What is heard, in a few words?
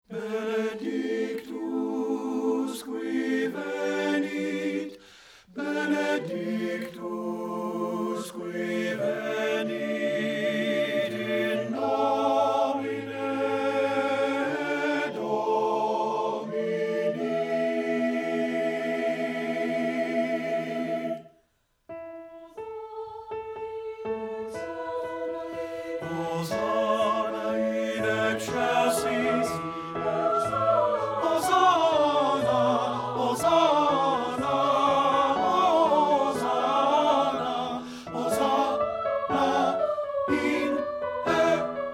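BENEDICTUS TENOR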